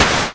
Syn Dr, Overloaded Synthetic Drums; style kits

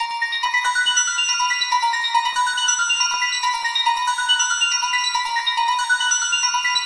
140 bells in the mist; SYNTH AND ELECTRONIC LOOPS

